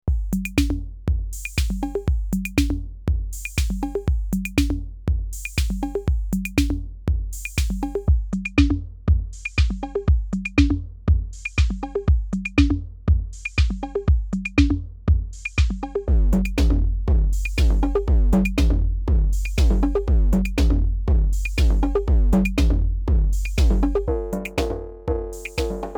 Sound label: Rotary CLS-222 Drum Loop; ARTURIA